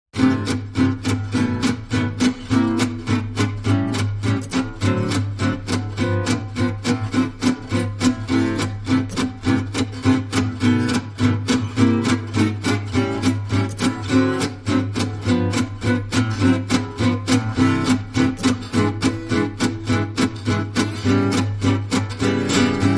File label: Guitars Only